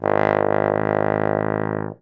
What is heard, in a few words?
BARITON; Best Service; Alpine Volksmusik